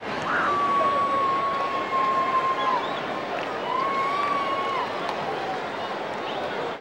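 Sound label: baseball crowd ambience chatter; SFX Kit; Crowds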